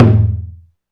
TAIKO, Korg Electribe EMX-1

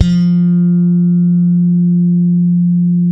Bass, F 4, ALL THUMBS; Spectrasonics; Bass Legends

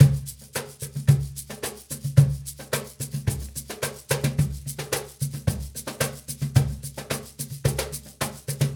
Cajun, Percussion, Drums; Essential Percussion

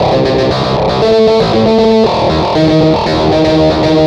Synth Distorted; Electrocution

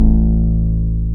D10, Synth Bass; LA Syn Bass

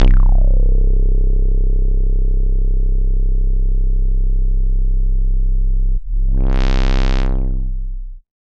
C1, SynthLine; 90 s Sample CDs; NiceBeats; SynthLine VOL-15

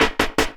LOOP SD; Ueberschall; Drum & Bass Resonance

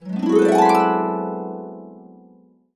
D, Harps; USB Soundscan vol.62; Complete Harps; AKAI

